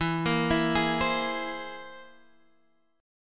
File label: C Augmented 7th E